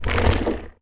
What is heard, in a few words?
creaking attack; minecraft; mob